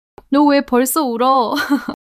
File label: laughter; monologue